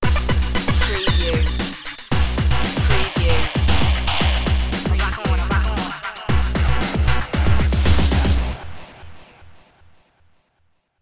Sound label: hit me sting